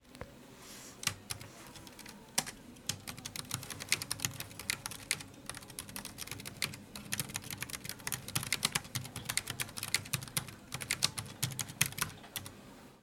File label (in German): tastatur; olympus ls-5